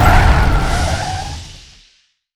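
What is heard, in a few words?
overdrive; piltover customs blitz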